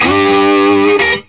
SOLO G, Vintage Blues Guitar

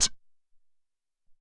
Vox Hat, Alesis SR-18

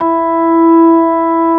ORG. E4; B3 FULL

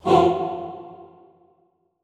HOO F2, Classical Choir SHORT HOO; Best Service; Extended Classical Choir

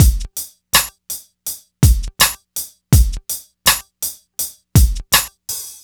DRM, Hip Hop and R&B Drumloops; Best Service; ProSamples vol.01; AKAI

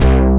POWER BASS

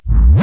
hard bass drumz

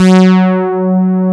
PA, ANA PAD

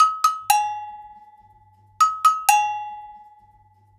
120 AGOG, Percussion Loops, AGOGOS

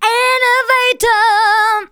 VOCALS SUNG; Techno Trance Essentials

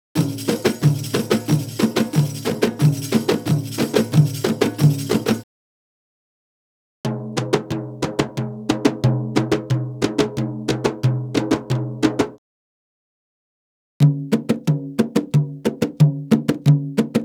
Arabic Rhythms

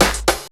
fx snare; Trance Explosion Vol1

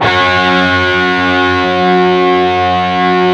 PRS PWR CHRD G 3 L, Guitars

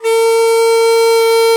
BON DI, Winds, Old World Instruments

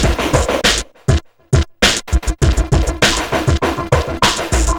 drloop, drumloops, Vinyl Attack; Masterbits

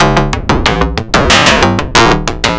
FM Perc Loop, Analog Glitch; Polyend; Stazma & Concrete Collage

